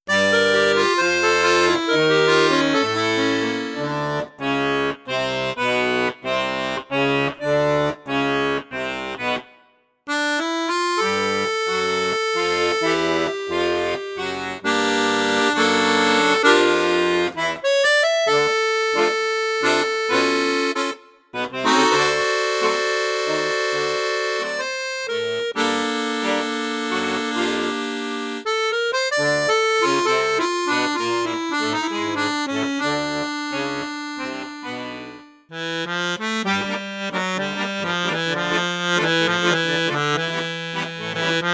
registre basson, accordeon; cavagnolo orchestre v

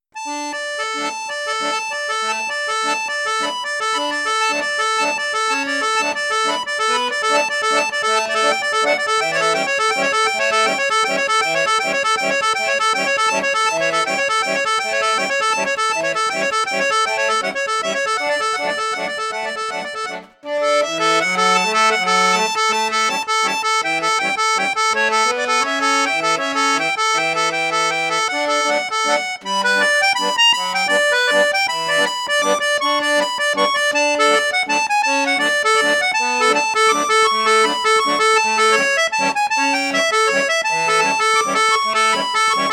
registre piccolo, accordeon; crucianelli super king

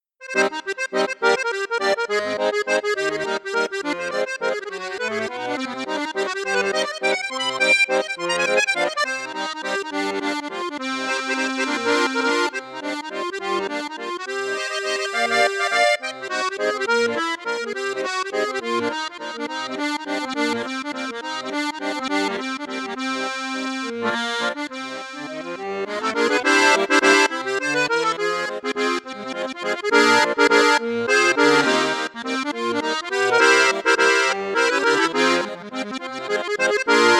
registre flute juste flute haute, accordéon; piermaria 312

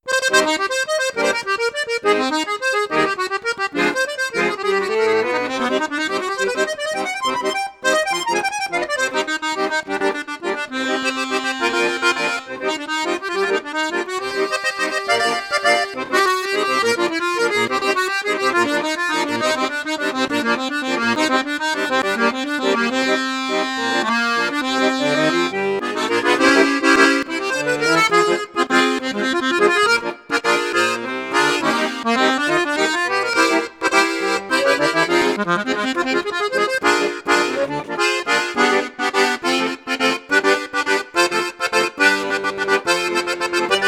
registre flute juste flute haute, accordeon; perle d'or

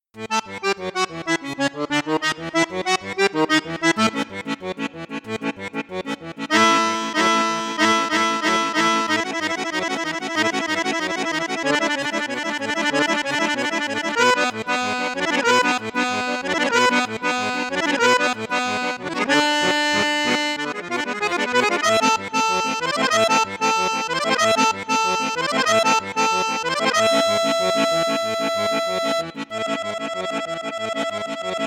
registre basson flute juste, cavagnolo orchestre accordeon

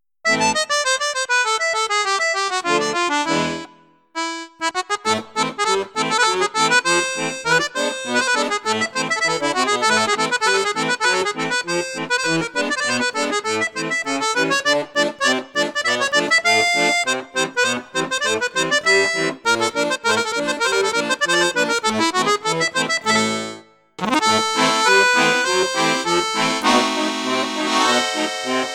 registre flute juste, accordeon; cavagnolo vedette 10

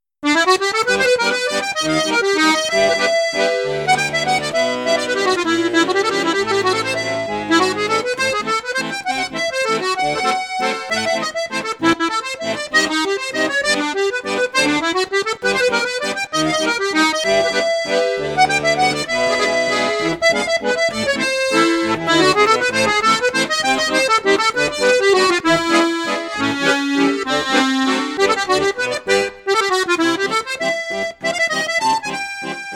registre flute juste flute haute, accordeon; cavagnolo professionnel 12

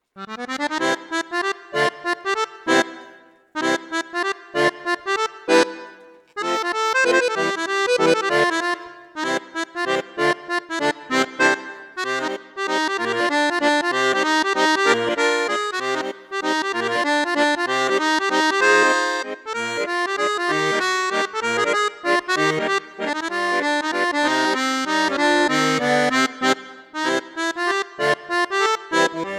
registre flute juste, accordeon; maugein amusette

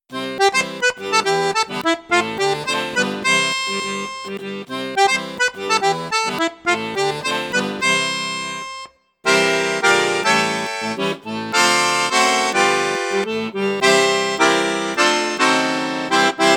registre flute juste piccolo, accordeon; cavagnolo professionnel 12

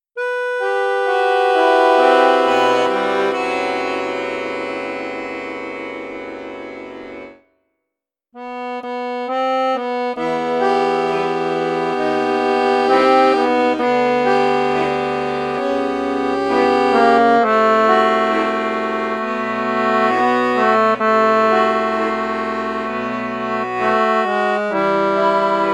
registre basson, accordeon; perle d'or

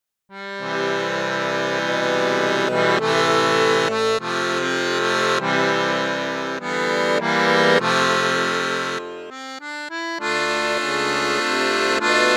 registre basson, saltarelle accordeon

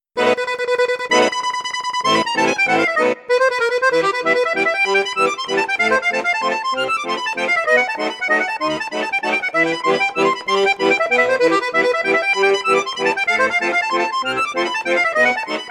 registre flute juste flute haute, accordeon; hohner vivaldi

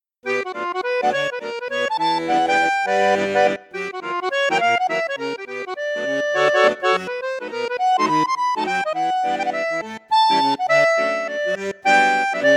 registre basson flute boite, accordéon; maugein resonance iv

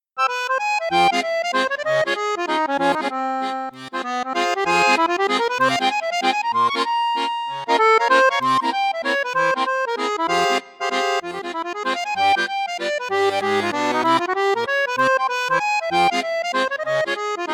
registre flute boite, accordeon

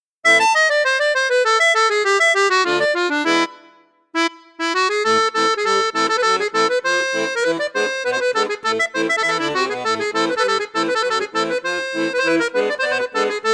registre flute juste, accordéon; fratelli crosio super musette 3

